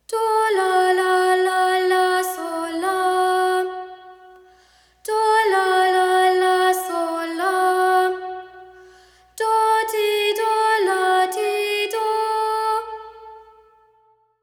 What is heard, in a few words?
do la la la la sol la